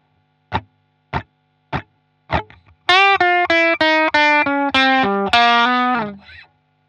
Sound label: blues, guitar